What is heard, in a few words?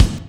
kik; virtual drum sounds